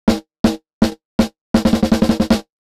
SSL X Gate snare

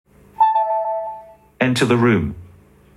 Enter room

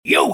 monster click; PPG

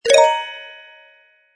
collect Paper

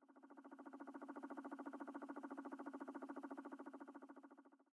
balloon fly

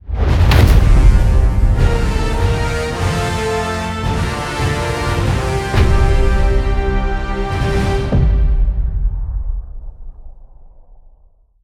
intro Screen; asgardian stones

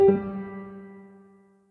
wood chip fail fast

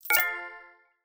win

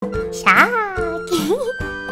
shark hehe; gura

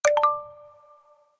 correct Answer

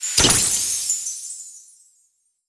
roulette win

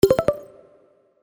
success

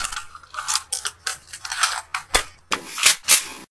reload mg

